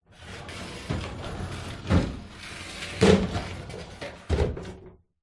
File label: household shower door plastic glass slide open close; zapsplat